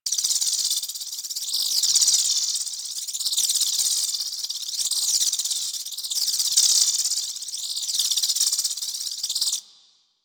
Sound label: Trip Laser Loop; Warframe; Trapper